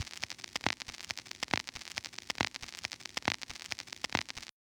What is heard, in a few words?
TurnTable, Yamaha DJX 2B